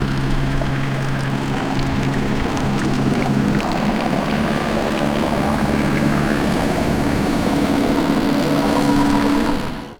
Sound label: realistic speedboat engin, watercraft